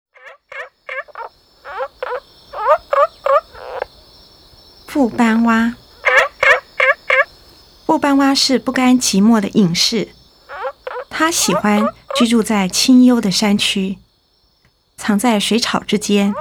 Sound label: Rana adenopleura